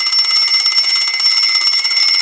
alarm; TamTamMicro.activity

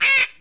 duck; TamTamMicro

